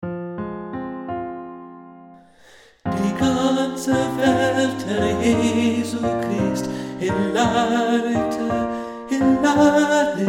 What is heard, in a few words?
Hilariter Tenor; st barts